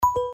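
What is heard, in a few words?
Key tone clear; LG T390K Wine 3G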